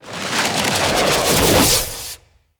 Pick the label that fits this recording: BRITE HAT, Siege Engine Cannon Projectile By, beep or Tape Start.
Siege Engine Cannon Projectile By